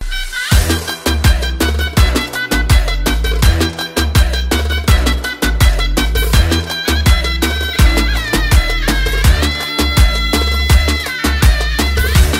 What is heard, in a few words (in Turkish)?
Bana Sor Zurna